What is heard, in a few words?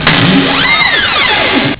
Door; a 2. ajtóhoz; VRML objektumok